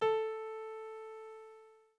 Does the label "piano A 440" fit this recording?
Yes